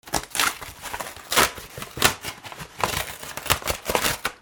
RIPPING

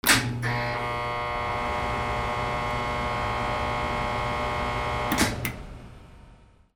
INDUSTRY

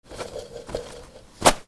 BATHROOM

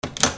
KITCHEN

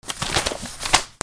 PAPER

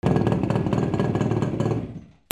VEHICLES

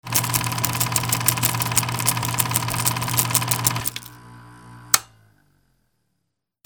CAMERAS

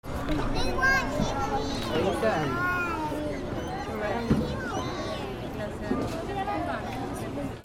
AMBIENCE

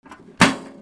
GARAGE

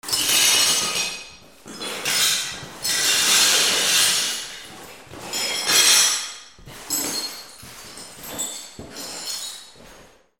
BREAKING

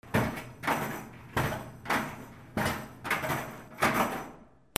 HOUSE

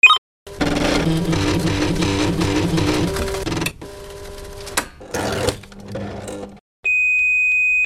OFFICE

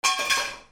BANGS

